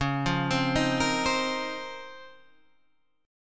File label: C sharp Major 13th, C#M13 chord; C#M13 arpeggiated; 9,8,10,8,11,8; JGuitar